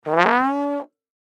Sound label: Baritone Short, Baritone Short Note Change Slide; Cartoon; Musical; Blastwave